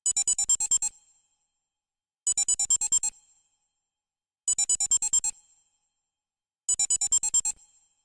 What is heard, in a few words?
Digivice Beep x4 Clean Loop